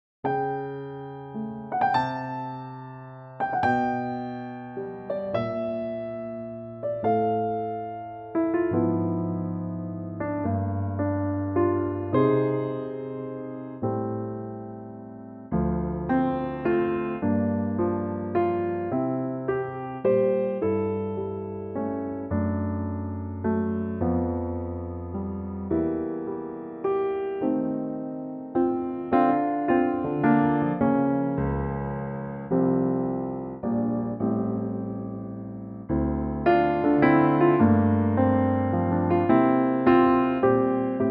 F piano trak; key - F - vocal range - E to F; moody piano only arrangement

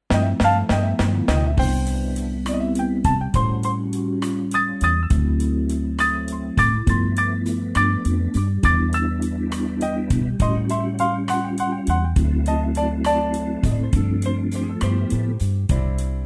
blues

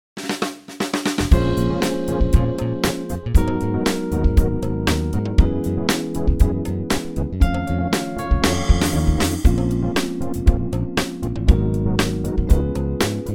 Bbm to Dm smooth; key - Bbm to Bm to Cm to Dbm to Dm - vocal range - Bb to F

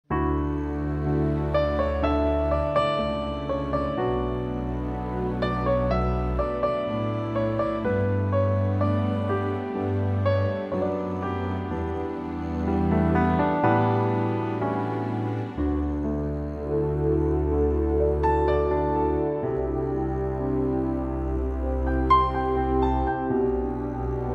D orchestral

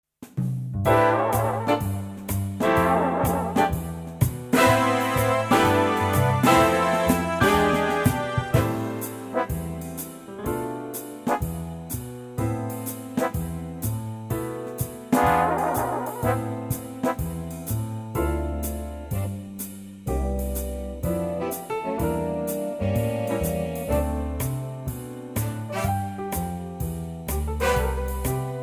Am swing

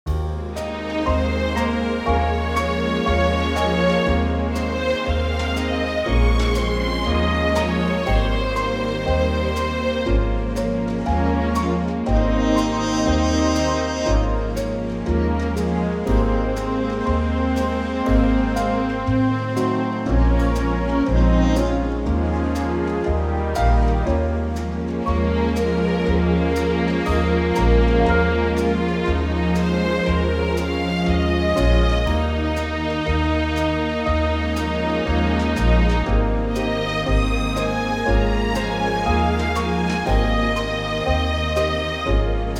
orchestra Ab

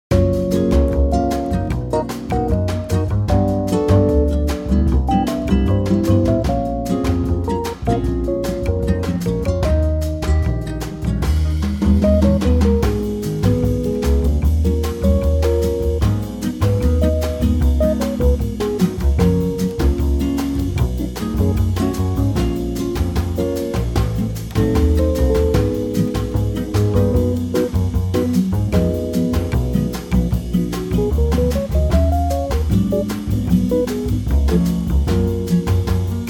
Trio Gb Bossa; Latin; key - Gb - vocal range - D to Ab; A super sexy Bossa with light percussion arrangement of this classic standard

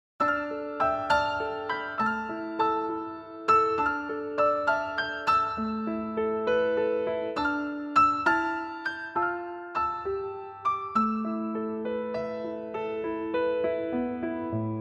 piano A

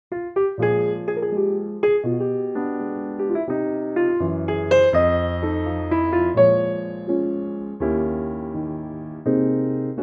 Ab piano; key - Ab - vocal range - C to Eb

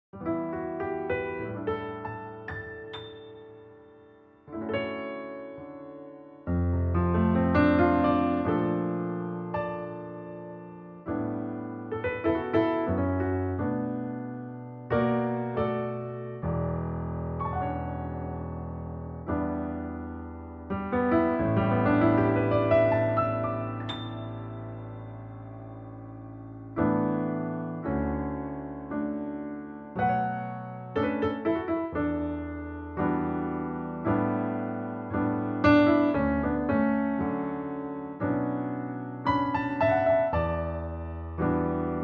D to Eb piano

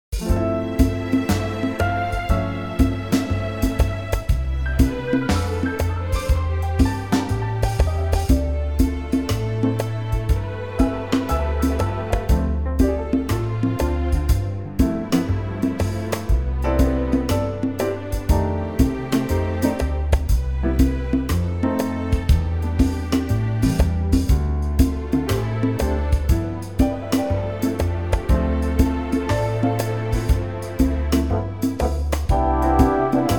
C orchestral

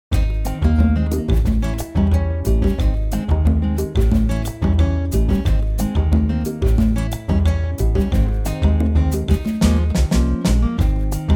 acoustic Ab

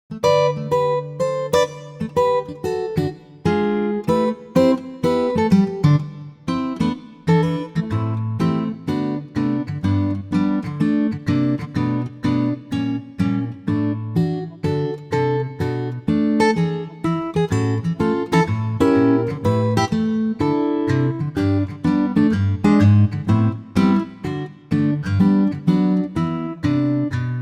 Gtr; G Acoustic Guitar version 1; key - G - vocal range - D to F#; Stunning acoustic guitar intimacy in a swinging arrangement that works so well for this festive classic

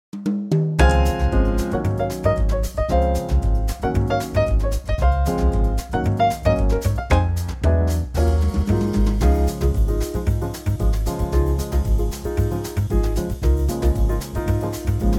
Db Latin Trio; key - Db - vocal range - F to Ab (optional top Bb)

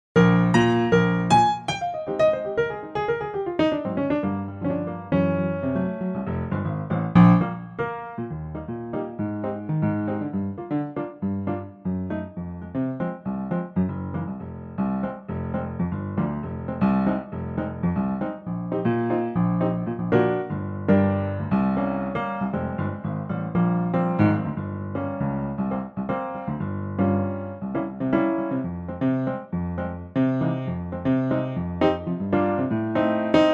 Eb piano; latin Pianotrak; key - Eb - vocal range - F to Ab; Fantastic latin arrangement piano-only track.

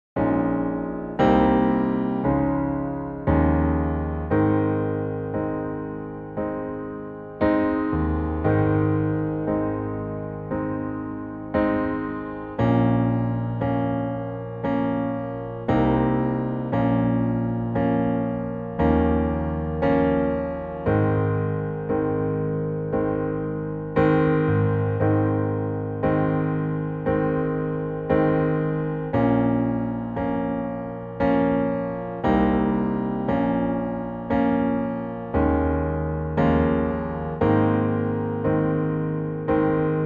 dont be a fool Ab piano

